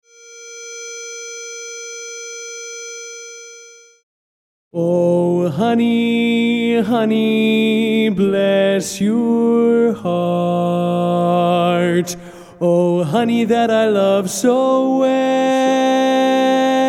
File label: Lead